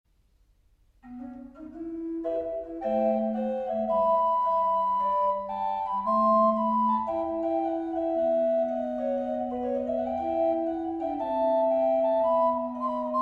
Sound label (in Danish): orgel; Kain hvor er din bror